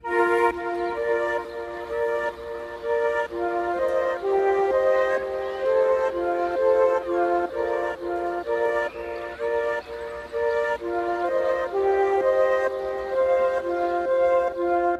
bonus music loop; sugar Parade; Mahi Gaming

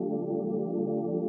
reel Spin; 108 heroes Multiplier Fortunes; Mahi Gaming

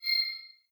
play button; Skillzgaming; gems odyssey desktop

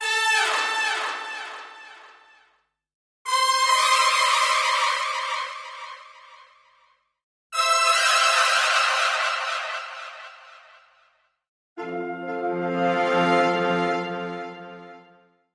Big Win; Diamond Empire

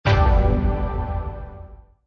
win huge start; Rabcat; Classic 243

